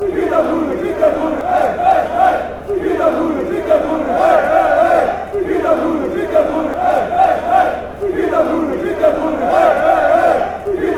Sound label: beerschot chant